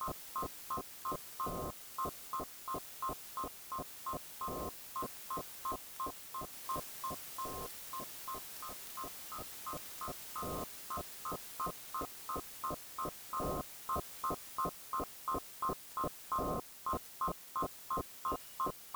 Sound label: 11018kHz; SDRuno